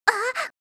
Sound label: aah; reaction